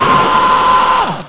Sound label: hit